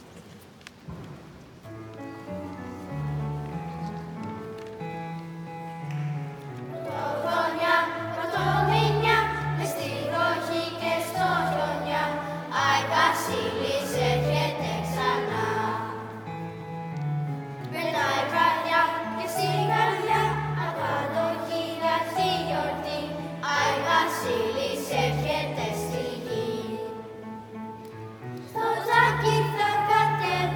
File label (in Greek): Καλαντα Φωτων Τραγουδι; Δ Δημοτικου